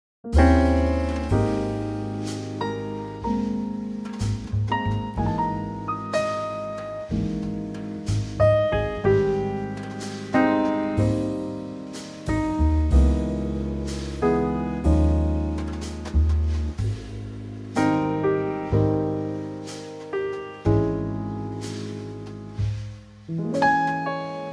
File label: Ab Trio